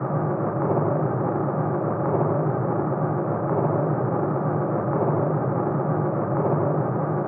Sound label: ambient battle; World Of Warships